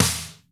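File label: processed snare, drums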